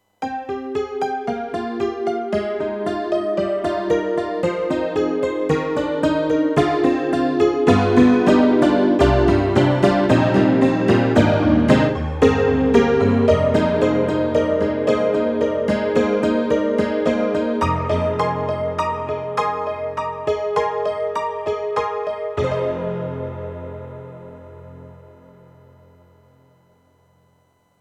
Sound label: YAMAHA MU500 HnkyTnkK, ピアノ